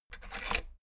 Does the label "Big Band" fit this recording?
No